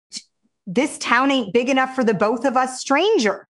lose; tetrisattack; beth

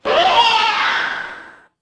smash tent; monsterplex

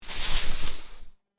water dry

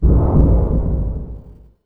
missle launch; fertig-classic-games; Master-of-Vega-Tutorial

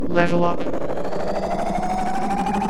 level Up; Neon Blocks

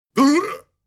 Enemy Shooter Death; overrun

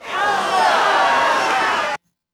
crowd cheer; monsterplex

